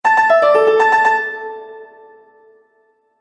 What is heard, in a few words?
Bright Melody Mobile App; Musical